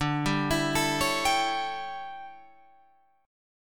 D Major 7th Suspended 2nd Suspended 4th, D, down, Guitar Open F; DM7sus2sus4 arpeggiated